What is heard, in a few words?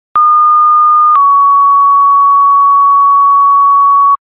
ems, tones